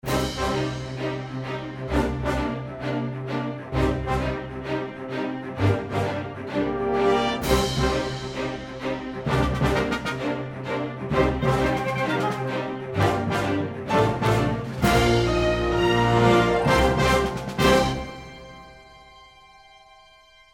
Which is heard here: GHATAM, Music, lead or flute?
Music